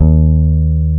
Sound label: BASS D#2, Electric Basses; PlatinumPhattCD2; E-MU Producer Series Vol. 8 – Platinum Phatt